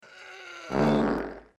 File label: FART; actionherothemovie